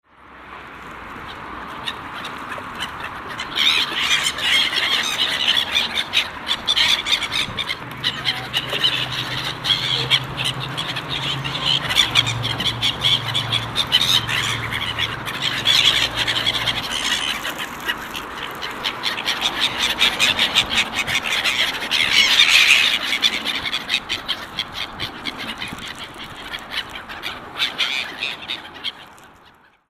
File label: seagulls and swans south canal